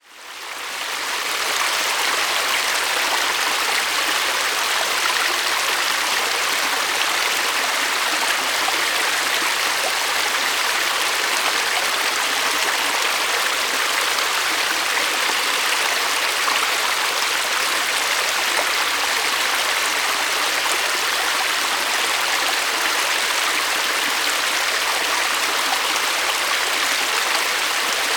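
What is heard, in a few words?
nature creek wide shallow fast buderim forest queensland australia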